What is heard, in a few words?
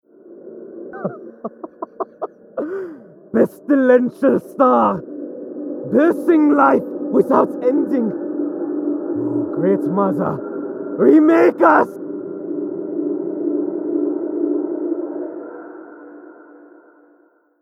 Womb; Mothership; UCR Audio Horrors